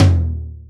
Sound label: Low Tom